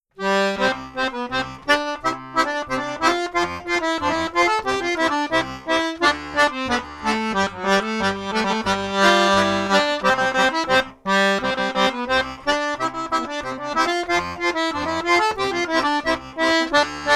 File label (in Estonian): Viguriga polka reilender